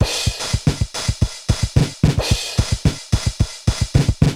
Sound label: Korg MR-16 (110BPM), beats; musicradar; cyborg beats